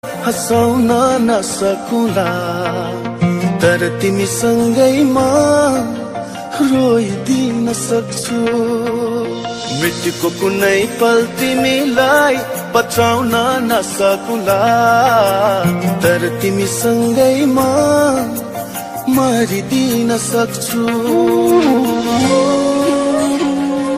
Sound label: nepali ringtone